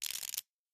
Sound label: Chip Collect; Real Dealer European Roulette Classic